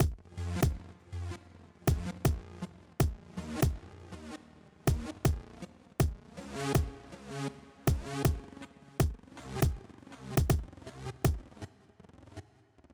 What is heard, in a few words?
bass